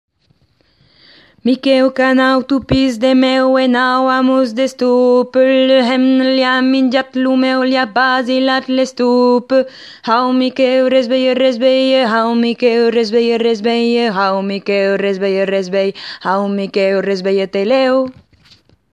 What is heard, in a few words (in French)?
voix basse; Ateliers chant